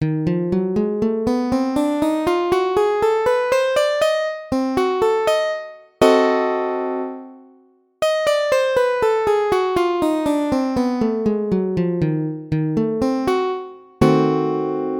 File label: Ebdim scale